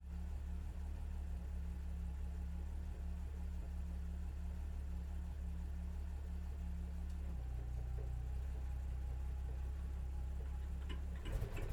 Pvrbbv 551 ONBRD SLOW Drive Stop Reverse Stop Drive Stop ENGINE Intake, Tank Destroyer; DPA4062